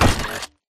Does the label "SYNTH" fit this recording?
No